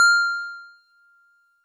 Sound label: Wood Piano DX100 F6